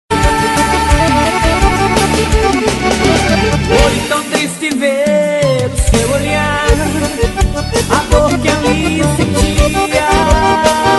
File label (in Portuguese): som e louvor acordeon